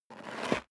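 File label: step sneaker concrete grit scuff, footsteps; schritte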